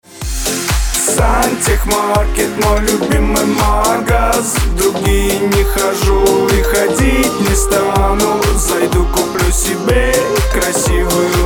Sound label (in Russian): vokal